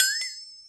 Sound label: Metal, Percussive FX; musicradar; cartoon caper